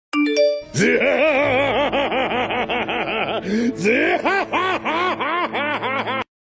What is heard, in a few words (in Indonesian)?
Nada Dering Zehahahaha; One Piece Kurohige